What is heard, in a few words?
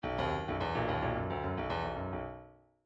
bdf midi file grooves funk k funk k; Pr Funk K